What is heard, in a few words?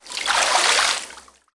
Sfx Golf